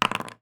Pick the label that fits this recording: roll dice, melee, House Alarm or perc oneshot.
roll dice